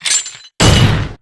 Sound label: desk slam; blackquill